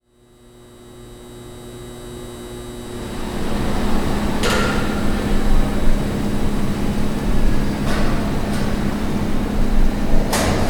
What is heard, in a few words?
Industrial Factory Ambiance